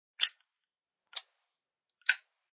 Flip Lid lighter opened, lit, and closed; Flicks (Sound Effects:Objects)

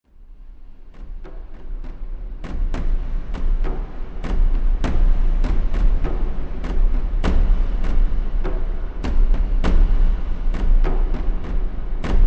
Drums Of Death, scary soundscape; Scary Soundscape Sound Effects

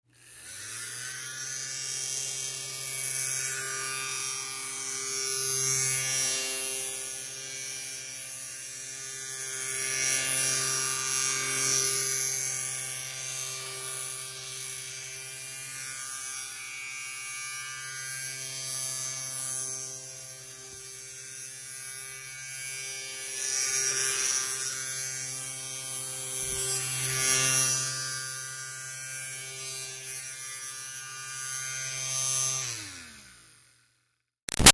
Fan Small Handheld Flying Moves Slow; Electronics; Big Room Sound

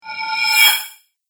Cymbal Resonant Whoosh

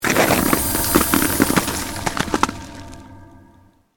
CREATED MAGIC SPELL FREEZING TOUCH; A series of magic or arcane spell sounds designed to suit fantasy themes, Arcane,Created,Fantasy Magic,Ice Magic

